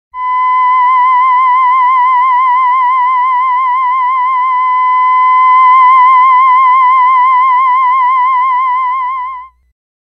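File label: theremin note B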